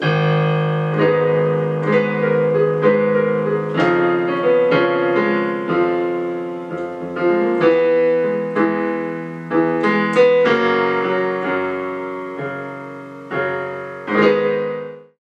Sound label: Piano